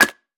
泥砖 踩踏声

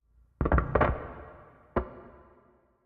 诡异森林氛围声; ambient

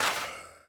灵魂沙破坏声

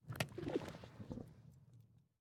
试炼刷怪笼环境声; ambient

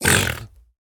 猪灵蛮兵受伤声

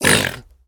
猪灵受伤声

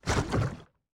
炽足兽熔岩上行走声

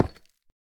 深板岩放置声

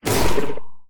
攻击冲击声; 监守者

